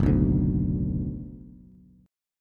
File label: Gb Suspended 4th Sharp 5th, Bass; strummed; JGuitar